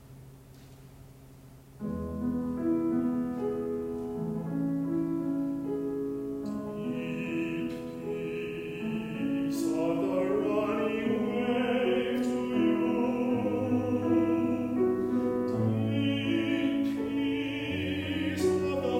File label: A (Postlude)